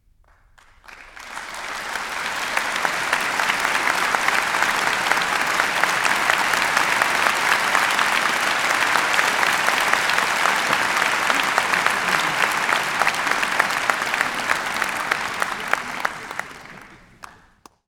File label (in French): Applo Moyen Large